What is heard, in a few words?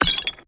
potion up; skyrim